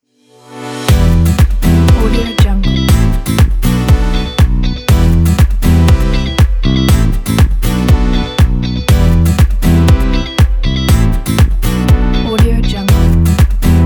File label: upbeat pop